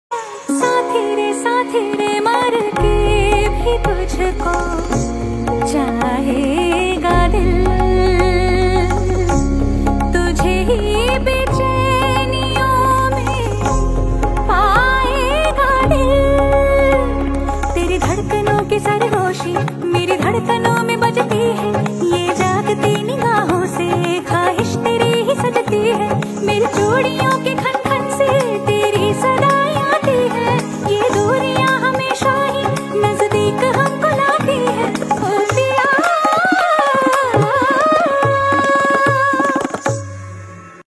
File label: Female Version Ringtone